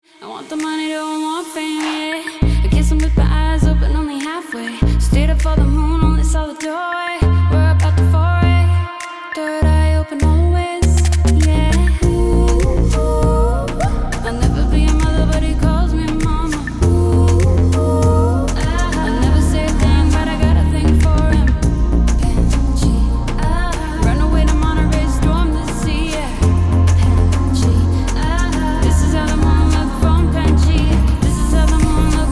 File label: Modern Pop; Internet Session, Los Angeles